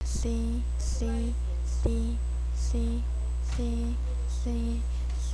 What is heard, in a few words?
sea